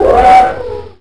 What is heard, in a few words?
elephant blast, animal; Tony Hawk's Project 8; XBOX